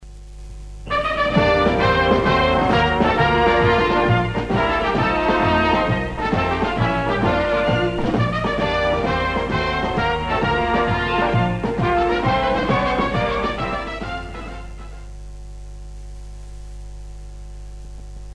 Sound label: signature tune; Network UK